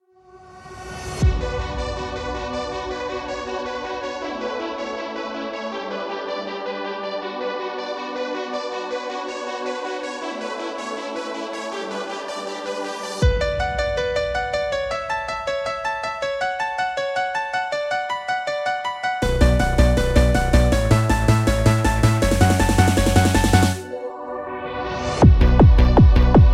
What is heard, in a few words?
Karaoke Version